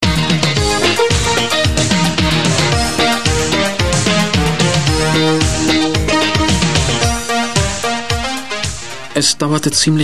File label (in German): riesenradmusik